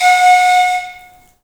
AF FLUTES, Heart of Africa

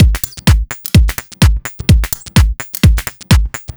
Tech Glitz, Drum Loops, 127 BPM; Sonic Foundry (Sony Creative Software); Underground UK House & Electro

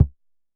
Drum X Kick, Pearl Drum-X

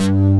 PHAT SYN BS, DANCE; Pro-Rec - Synthsonic; Z1 DANCE

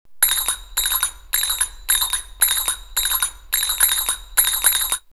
Cairo 108, drumloops World & Ethnic; X-Static Goldmine

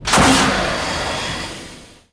sfx sci fi; Masterbits - Switch FX

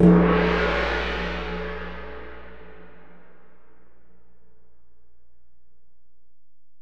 Ride, Alesis DM-PRO, Drum Machines

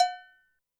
bell, Zoom MRT-3B

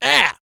A YELL, MALE HUMAN; ILIO; Vocal Planet

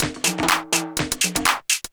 124bpm, House Perc Loops

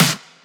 Snares; Vengeance - Essential Clubsounds Vol.4

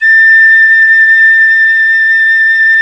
FLT PICOL, Flutes, Picolo& Flt; Club-50 - Foundations Roland

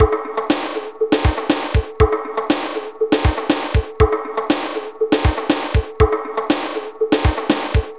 Vocoded Tabla Beat; Sonic Foundry (Sony Creative Software); Myths of Technology; Composite Beats

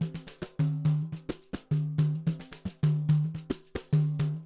NYC Percussionworks loops 108; Sampleheads

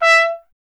trumpet, E 1; Miroslav Vitous - Solo Instruments VOL-1

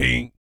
BASS FX; ILIO; Vocal Planet VOL-3; Jazz & FX

